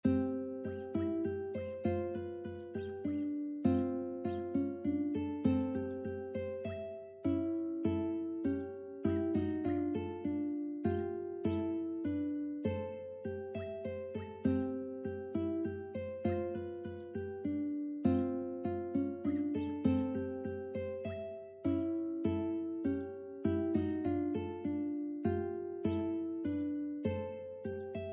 santa lucia uke

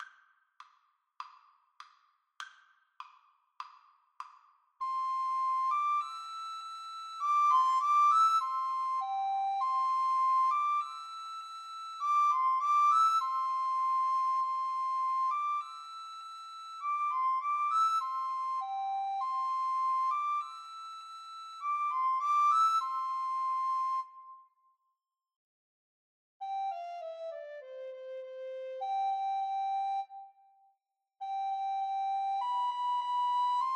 alouette Recorder duet

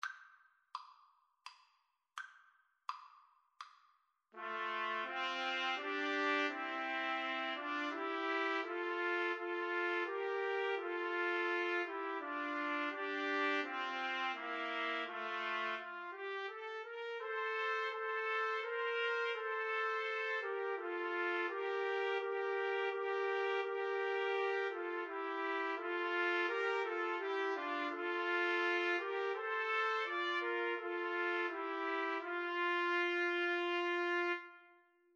my country tis of thee, Trumpet Trio

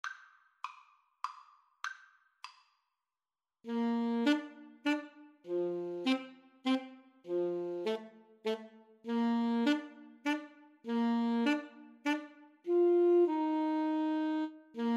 happy birthday, Clarinet-Saxophone Duet

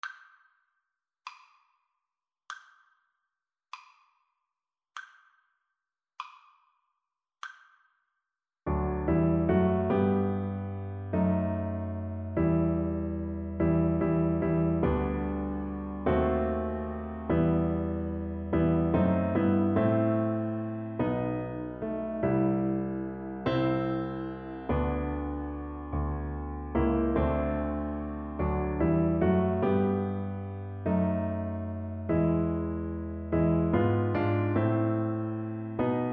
lift every voice, Viola

